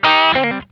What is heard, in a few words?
Funk Guitar; Zero G - Funk Guitar